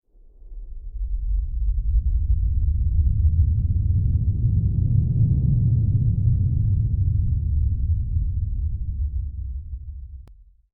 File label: wind, Mines; AMB; OneShot Fading Memory